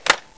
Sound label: xm8 foley